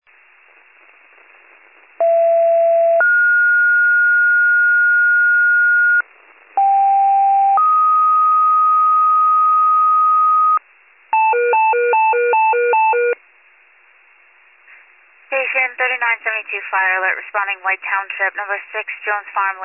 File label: fire, pager alert